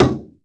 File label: knife desk